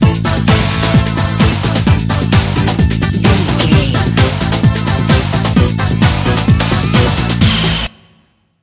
party end time bumper, Dance, EDM, Club, Exercise, Aerobic, Aerobics, Spinning, Workout, Disco, Eurodance, Confident, Energetic